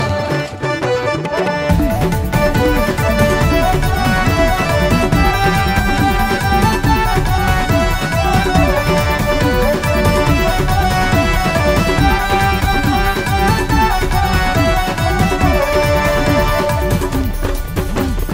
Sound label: instrumental music ringtone